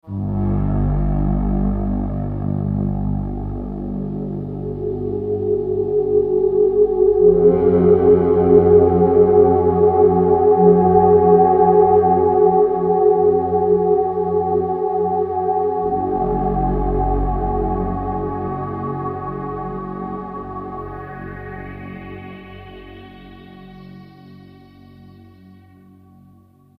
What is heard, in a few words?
stinger soft